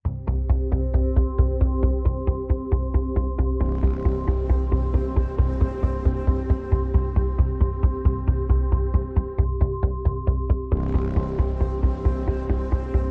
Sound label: Pads and Pulses Only